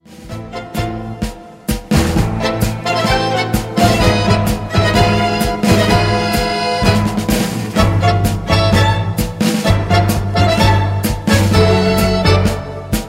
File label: Tango